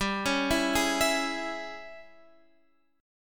Ab Major 7th Suspended 4th Sharp 5th G

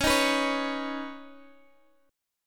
C sharp 7th Suspended 2nd, C sharp

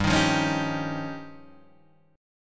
F 7th Suspended 2nd Sharp 5th

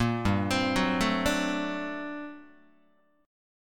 G Minor Major 11th G down Guitar, GmM11 chord; GmM11 arpeggiated; 1,3,3,4,1,1; JGuitar

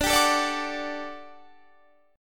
G Major 7th Suspended 2nd D

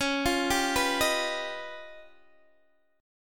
Db 9th Flat 5th Db down Guitar Open D

